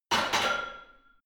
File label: barbell heavy plates replace rack; sport freizeit; fitnessstudio